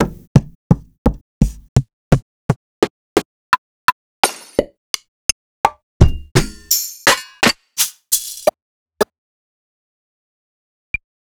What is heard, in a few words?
sliced foley